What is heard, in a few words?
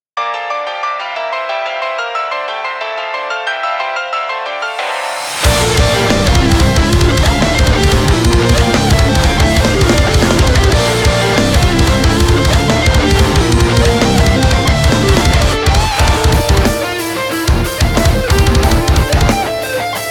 Off Vocal